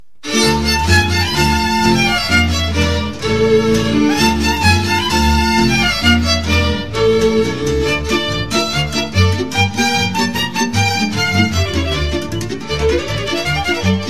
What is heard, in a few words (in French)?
Cardas de Kopanice - Slovaquie